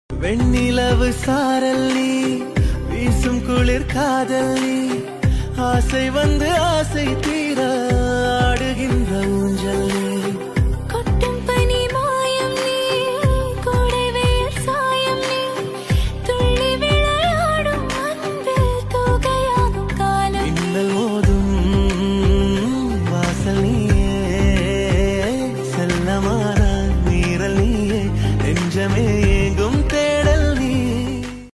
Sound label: song ringtone bgm tamil ringtone; Amaran movie bgm; Sai Pallavi; new tamil movie ringtone